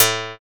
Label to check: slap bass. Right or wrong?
Right